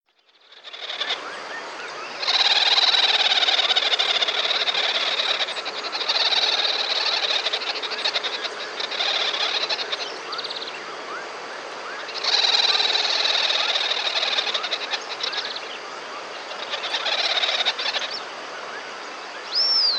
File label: Least grebe; پرنده کشیم کمینه